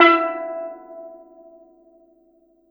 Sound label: STEEL DR; E-MU Producer Series Vol. 7 – Old World Instruments